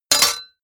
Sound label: sword block metal